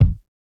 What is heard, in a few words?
Jazz hop kick; Hiphop